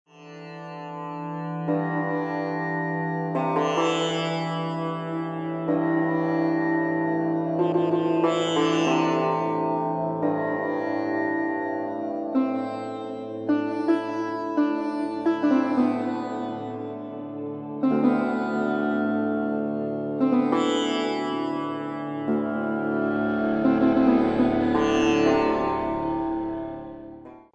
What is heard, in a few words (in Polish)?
muzyka bez oplat